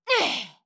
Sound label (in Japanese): 攻撃